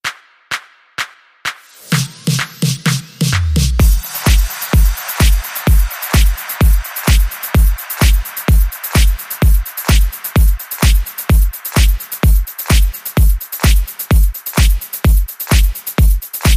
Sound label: Beat